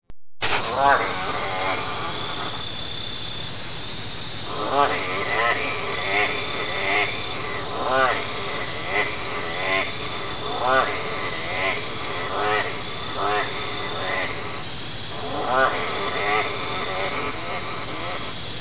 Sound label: mahogany glider